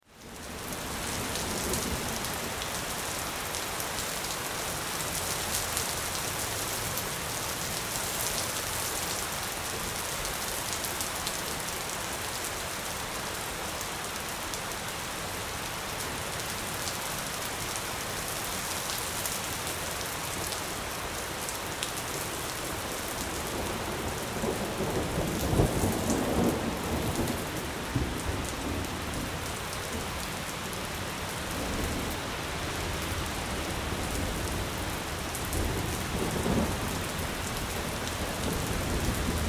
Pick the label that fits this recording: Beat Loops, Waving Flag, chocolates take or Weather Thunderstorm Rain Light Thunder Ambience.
Weather Thunderstorm Rain Light Thunder Ambience